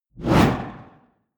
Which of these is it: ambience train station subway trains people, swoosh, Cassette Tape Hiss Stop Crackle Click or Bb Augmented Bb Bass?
swoosh